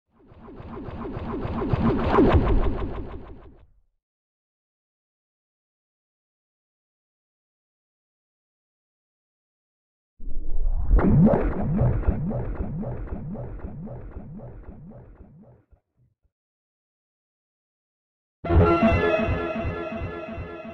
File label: scifi bubble wave drone set